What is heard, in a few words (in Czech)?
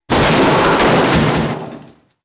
pad auta; Mafie 1